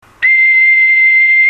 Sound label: bosans pipe